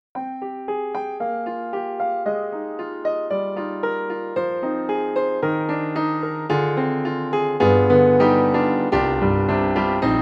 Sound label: KORG KRONOS 2 Japanese Dark Grand